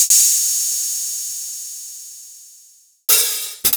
Korg 05R-W Korg Hihats

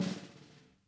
SNAR, Interactive Drum Kits; Sonic Reality; ID SNARE 1+

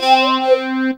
SYN C 3; Best Service Gigapack 1

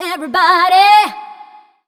f mel f, fem Vocals; Mutekki Media; Diamond Vocals 2

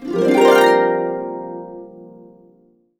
Harps; USB Soundscan vol.62; AKAI

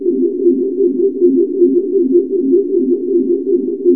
sequence loop; Zero-G Ambient 1

